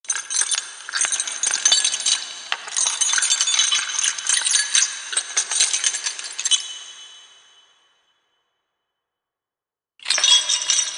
Ghostly Chains, Sound of ghostly rattling chains with large space ambiance.; Ghosts; Horror